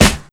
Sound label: studio snare one shot c sharp key